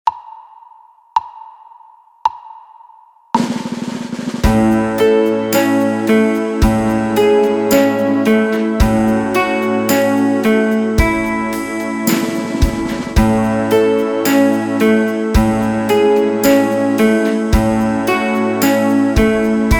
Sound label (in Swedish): gitarr